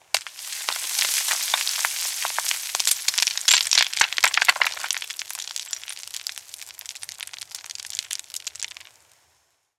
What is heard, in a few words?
scree rock; Sonniss GDC